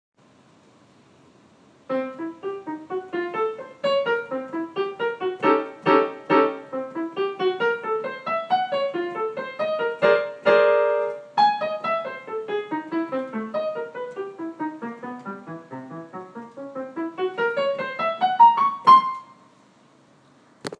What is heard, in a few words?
Bouncing Ball